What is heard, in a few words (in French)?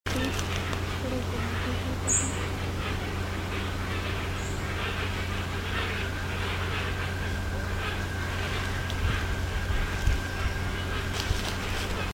ariane versicolore, chant